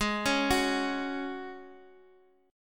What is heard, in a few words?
G sharp 7th Suspended 4th, Guitar, DADGAD; down; chord shape